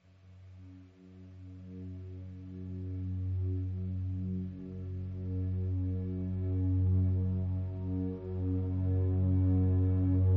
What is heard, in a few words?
Deep Resonance Theta Delta Waves